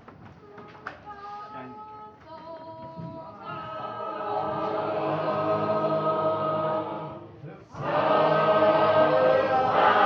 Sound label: Gospel Trumpet, sacred harp